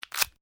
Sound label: DRY BRITTLE SNAPPING; Various Organic Foley sounds